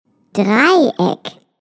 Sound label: TRIANGLE